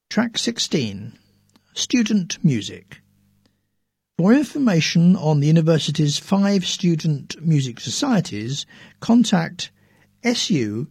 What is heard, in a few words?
Student music; embracearts; Hear this WO Spring 2013